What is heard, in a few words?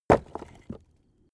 stone small impact; natur-tiere; steine